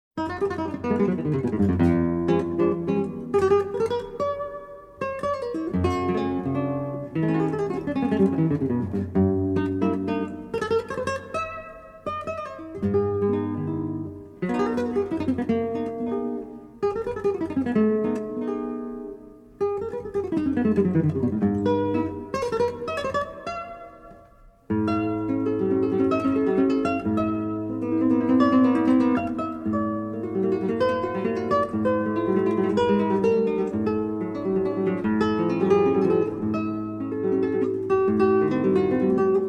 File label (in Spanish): guitarra